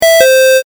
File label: correct; ５連続の大正解の超低音ver; 正解の音・ピンポーン